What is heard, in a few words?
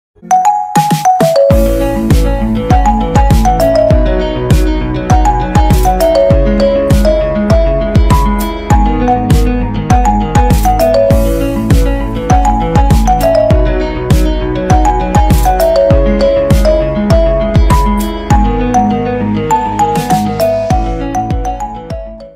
ringtone instrumental